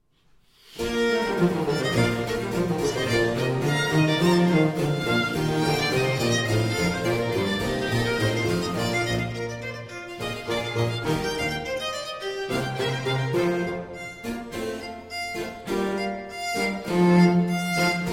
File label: Concerto For Musette, 2 Violins and Basso Continuo in C Major, Allegro